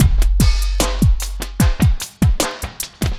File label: hiphop